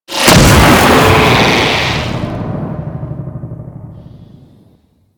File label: Pet Null Ability; Abilities